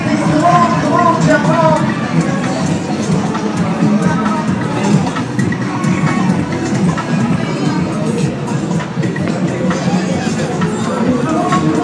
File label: 60 seconds of ambience at fair; woodhouse moor leeds